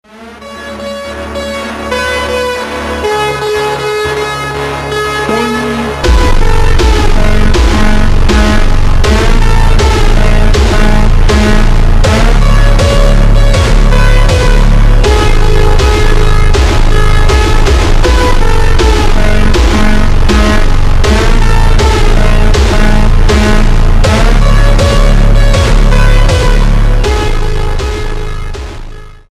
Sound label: phonk super slowed reverb; Электроника; Ремиксы